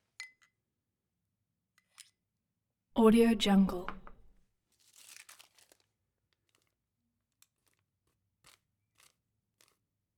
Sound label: int brushing glue on model; collanderson